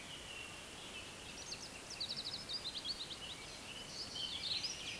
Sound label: Phylloscopus trochilus